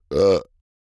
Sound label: burp; OPENAIR; Anechoic